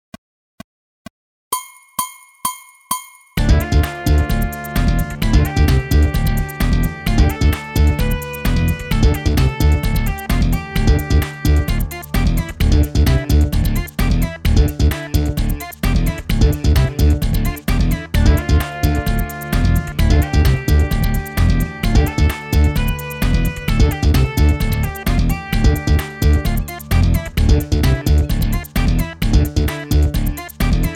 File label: lineaire groove loop